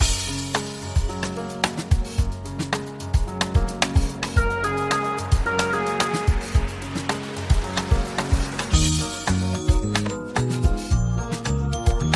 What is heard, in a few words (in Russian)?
babushka minus